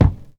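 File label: high quality dope kick drum f sharp key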